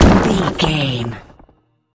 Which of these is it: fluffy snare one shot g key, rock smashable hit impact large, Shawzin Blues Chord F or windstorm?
rock smashable hit impact large